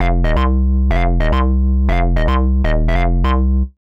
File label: Hard Synth Bass C, 125; PowerFX - Nu Jazz House